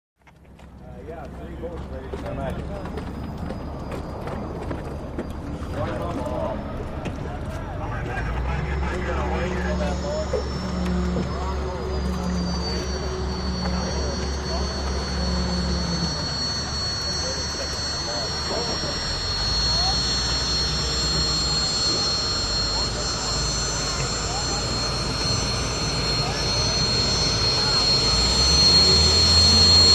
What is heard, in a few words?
Hydroplane Dock Amb